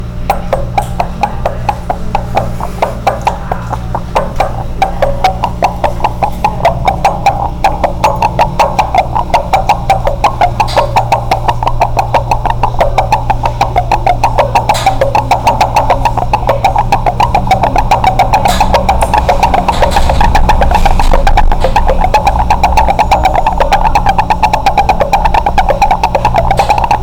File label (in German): Glas in Der Spuele; Brasil; Fieldrecordings